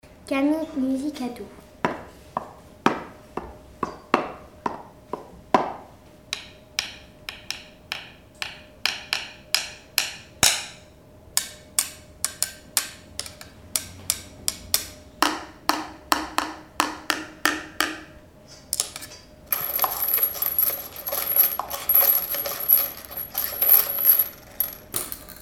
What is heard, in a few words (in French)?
musicatout